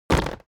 step walk wood plank creak; foley; smartsoundfx

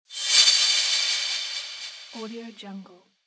swoosh transition; alexandersizonenko

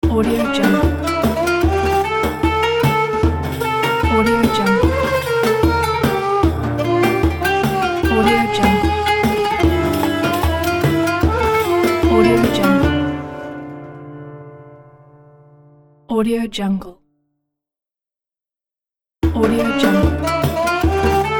arabic music ramadan logos ident; akm 2016

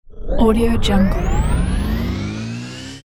futuristic data load

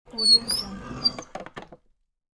piano bench opening

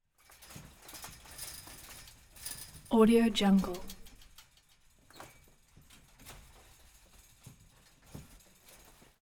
chainlink fence climbing erratic impacts; prosoundfx

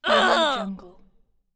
goblin pain

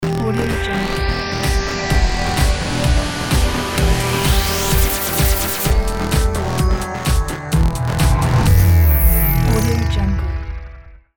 edm ident; scoringaudiogeeks

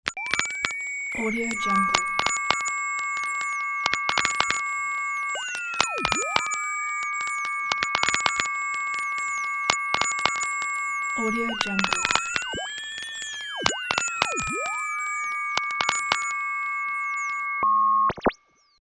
crackle of radio communication

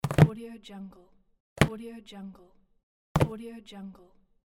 putting down notebook

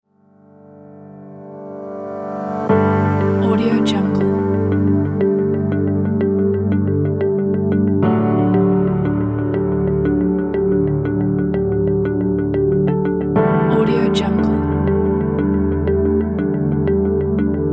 acoustic ambient

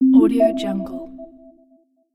beep notification

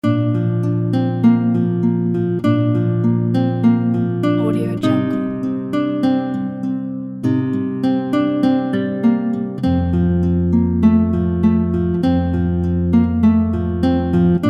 autumn fall nature music

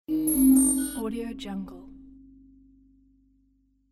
scifi hitech interface; volkovsound

